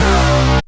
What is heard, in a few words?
DANCE SYNT, techno trance